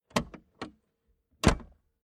Car Classic Bentley Eight Boot Trunk Unlatched Open Soft Close; Transport; Car Sound Effects